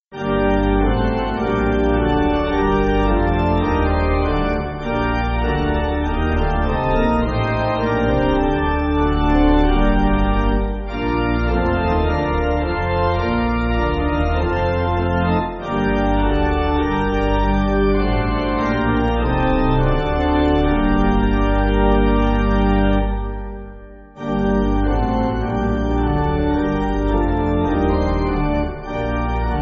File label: Pipe Organ, Free Music Backing Files for Churches; Thou Great Creator; Morfudd 8.8.8.8; 5/Gm